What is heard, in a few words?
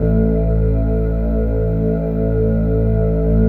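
spectral pad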